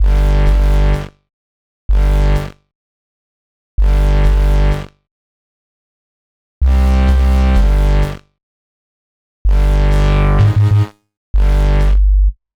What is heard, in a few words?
127 Gm Bass, Complex Electro Synths; Prime Loops; Shoxx